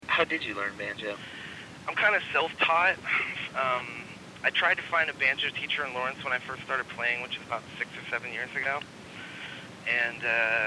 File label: banjo